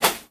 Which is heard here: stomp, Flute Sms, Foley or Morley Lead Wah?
Foley